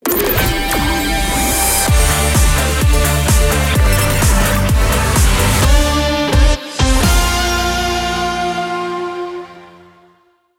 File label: FIRE ANTHEM JINGLE; RADIO CHABLAIS